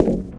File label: glass, footsteps, survivor, run